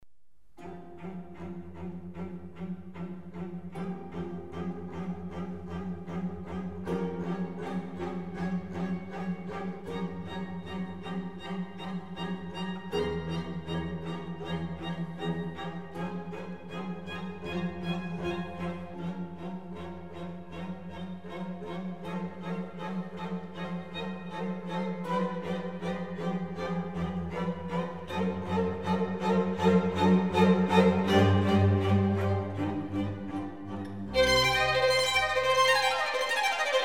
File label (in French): hiver; mouvement de l'Hiver, issu des Quatre Saisons de Vivaldi